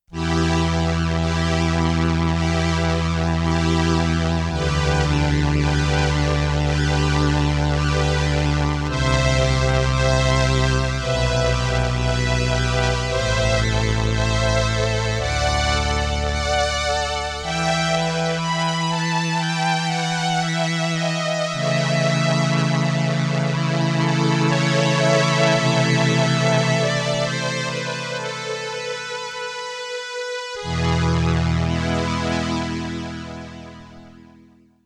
STRINGS I with slow attack, vibrato, sustain, and Ensemble I, Roland RS-202